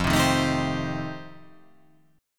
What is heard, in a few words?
F 9th F, chord